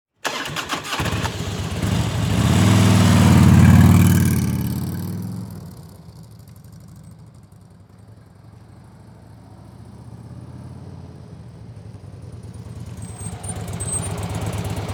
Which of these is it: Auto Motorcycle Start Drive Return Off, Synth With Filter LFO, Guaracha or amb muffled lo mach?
Auto Motorcycle Start Drive Return Off